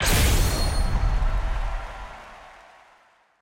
ui map finish story

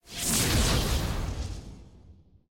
teleport arrive

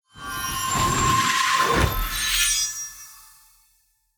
sfx honor level 3 checkpoint

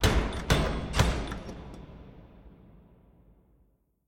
knock

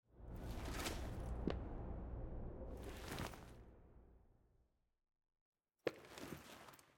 sfx cutscene art

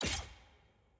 ui click

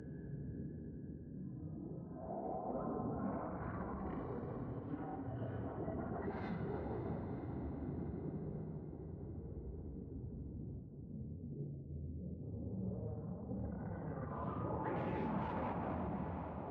amb

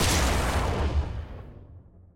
skill hit; jhin

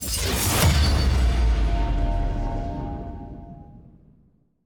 sfx readycheck intro

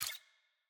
client hover

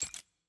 button cycle click; clash

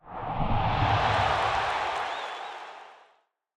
loading

